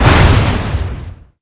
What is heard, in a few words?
thunderclap